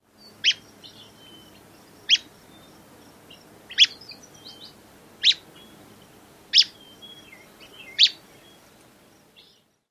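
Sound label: call variant, Willow Flycatcher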